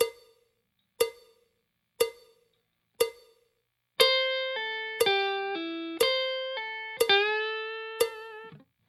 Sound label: blues tricks slow, guitar